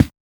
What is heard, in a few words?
run carpet